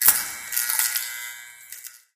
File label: light flicker end; Source Engine; Portal 2